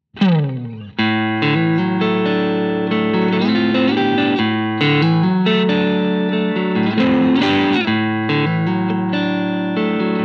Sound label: characteristic sound; jensentone